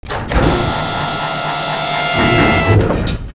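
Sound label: elevator mechanical close; doors elevators; Star Wars Obi-Wan; XBOX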